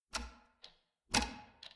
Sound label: Clock Ticking Short